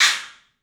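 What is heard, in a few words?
club cymbals; 3D Sound; 3D Drums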